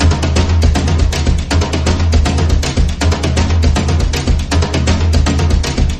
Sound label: drums; horde